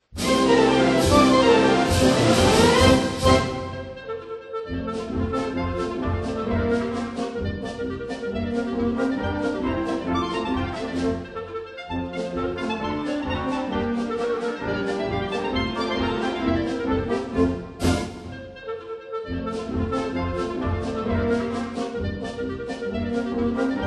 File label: clarinet